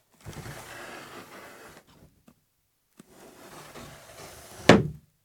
door; ふすまの開閉１

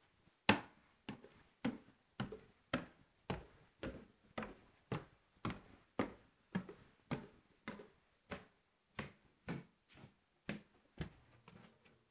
step; はだしで階段を上る（やや反響あり）

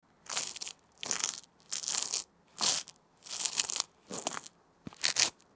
step; コンクリを踏みにじる

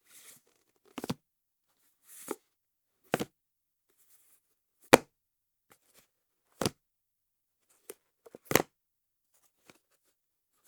book; ハードカバー本を、本の上に積み重ねる音

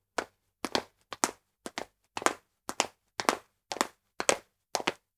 step; 固い床でスキップ

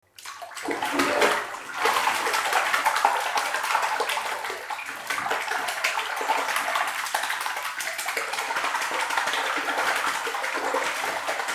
water; 浴槽で湯をバシャバシャさせてみた音

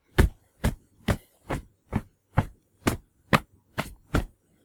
cloth; 服をはらう音

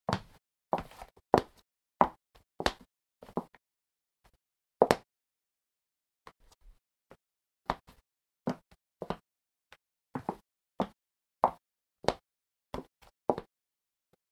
step; ハイヒールで歩く

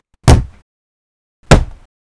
wood; 机を叩く音

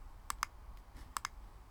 machine; クリック音

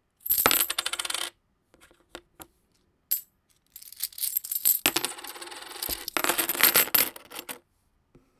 coin; お金を机の上にばらまく音

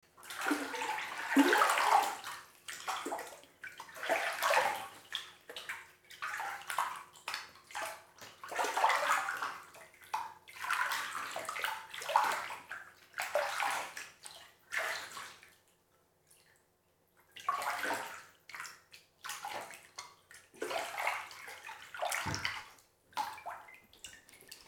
water; 浴槽で身体に湯をかける音

